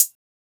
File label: HiHats; Closed Hats